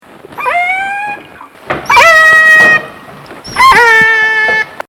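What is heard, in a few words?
Transient, whales; Killer Whales (Orcinus Orca) are distinctively marked black-and-white dolphins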